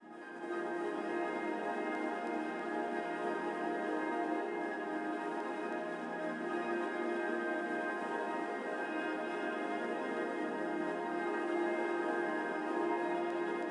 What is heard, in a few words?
soft piano